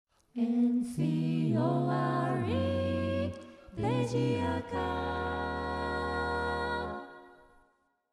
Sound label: jingle